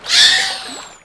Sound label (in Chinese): 海豚 死亡; 红色警戒2; RA2; 作者：西木工作室